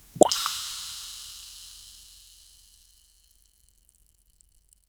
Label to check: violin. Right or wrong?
Wrong